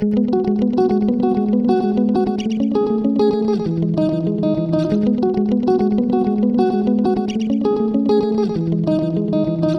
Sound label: guitar